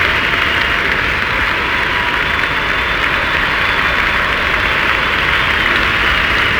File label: BACK NOISE; UFCTapout1; XBOX